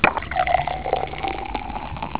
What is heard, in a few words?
ahh